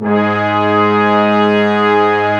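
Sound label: brass section, large ensemble long; Roland LCDP06 Brass Sections; Lrg.Ensemble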